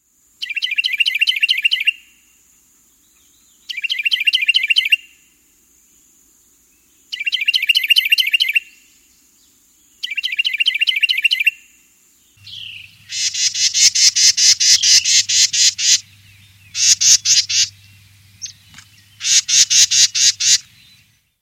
carolina wren

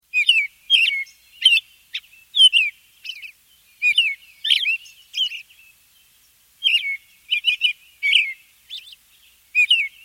robin; American Robin; Spring thru Fall

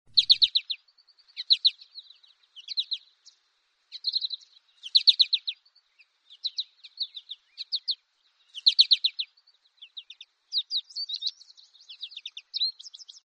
goldfinch; Colors Fade Fall & Winter

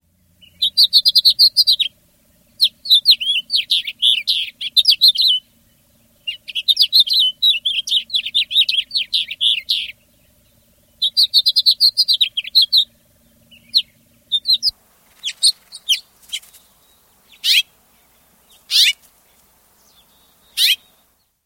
house finch

